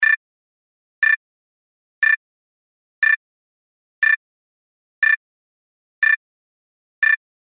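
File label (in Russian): электронный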